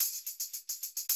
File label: 110, Classic Drum Loops